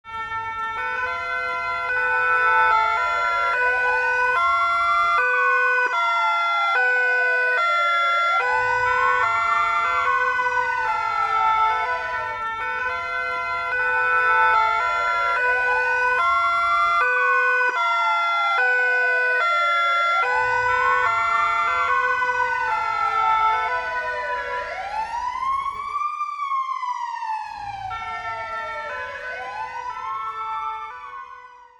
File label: Emergency vehicles multiple sirens passing by city traffic